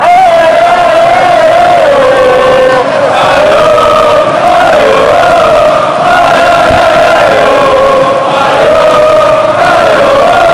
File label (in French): Allez allez allez allez oh, chant